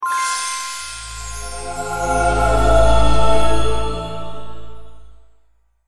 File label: puzzle solve; the da vinci code xbox 2006